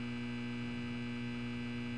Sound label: light hum; kingpin